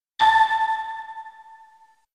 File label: message tone; Shenmue